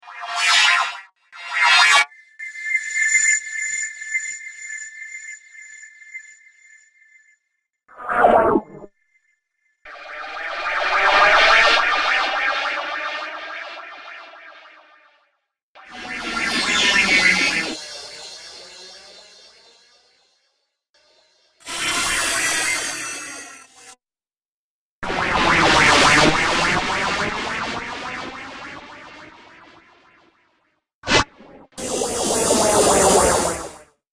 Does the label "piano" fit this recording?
No